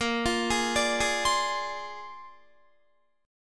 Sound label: A sharp 9th A sharp Guitar; arpeggiated